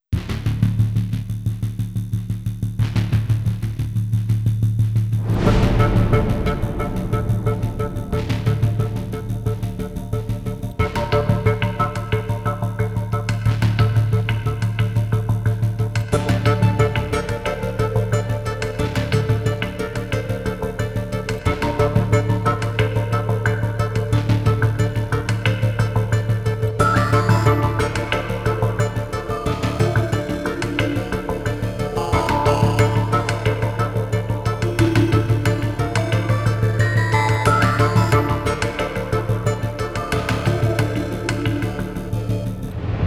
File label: tension